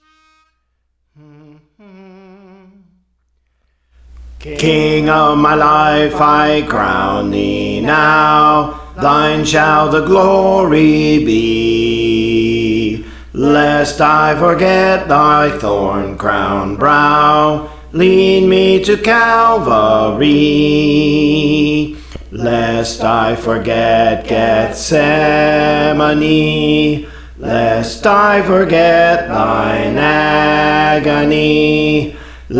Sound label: Bass; Praise For The Lord Training